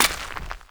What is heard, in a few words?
Jester Step Dirt, Footsteps; Foley; Warframe; Grineer Royal Jester